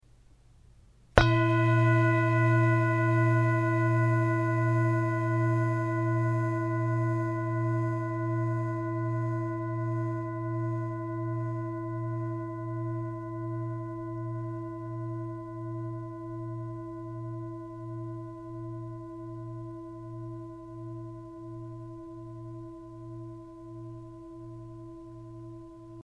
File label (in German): Klangschale